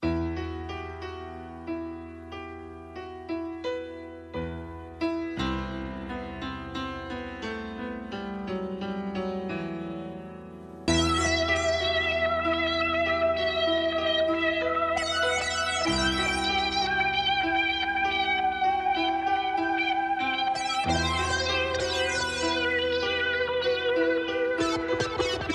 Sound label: keys, Sad Loops